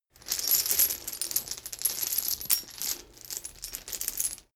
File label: metal chain pull up